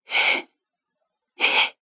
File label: sms